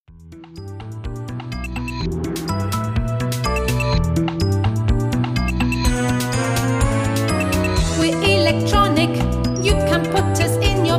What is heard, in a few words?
electronic